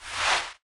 slide Closed

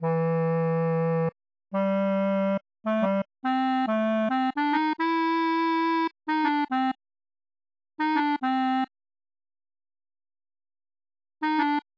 clarinet moment

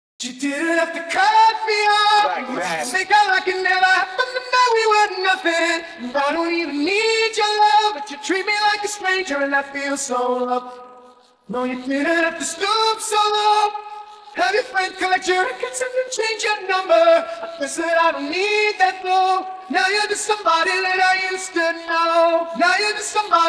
vocals; robot 64 lore

